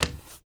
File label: drag step